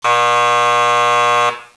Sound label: Buzzer